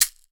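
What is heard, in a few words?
wah wah, shakers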